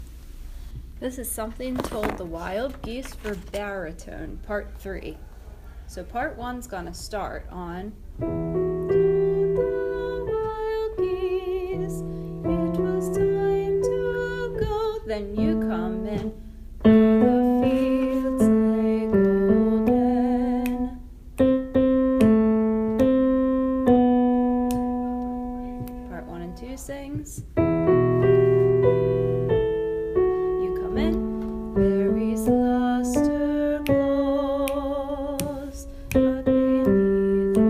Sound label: baritone